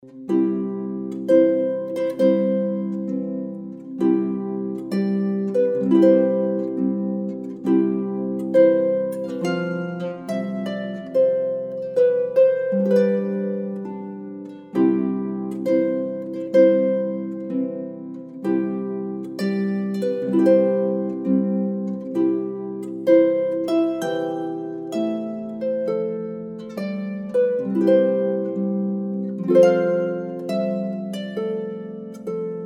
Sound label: march harp